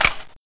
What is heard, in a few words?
saw open, weapons